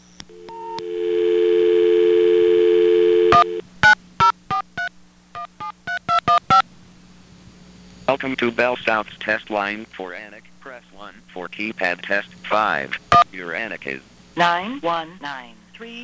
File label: BellSouth Test ANAC; BellSouth Test Line (with ANAC)